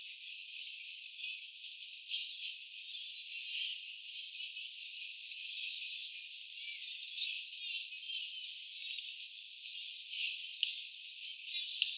Saguinus imperator

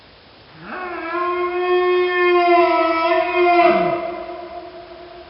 Cervus elaphus xanthopygus male

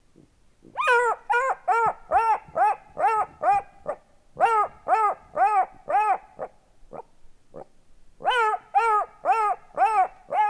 Canis mesomelas bark

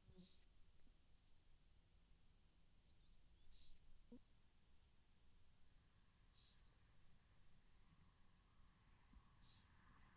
Spermophilus suslicus alarm

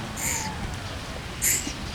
Macropus eugenii

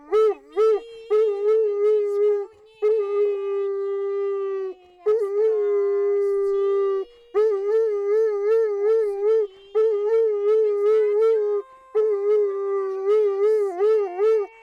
Canis familiaris; portos

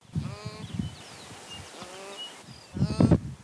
Aepyceros melampus calf

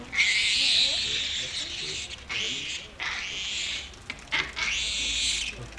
Papio papio

